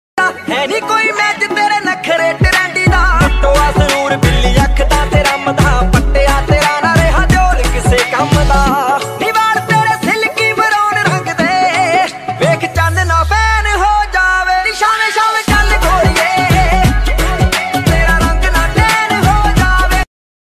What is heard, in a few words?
Dance Punjabi Ringtone